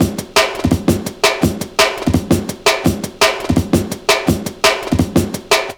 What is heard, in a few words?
LOOP, Explosive Jungle